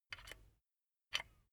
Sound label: silicone gun bullet lock mechanism soft; soundpool